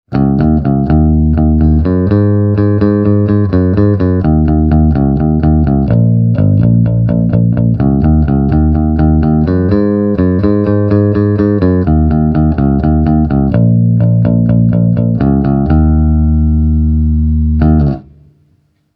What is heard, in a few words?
warwick bc 40 plectrum